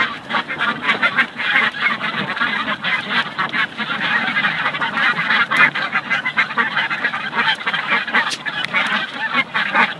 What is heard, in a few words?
duck; Одинокое кряканье утки